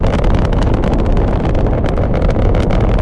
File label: Thrust; Geode Tear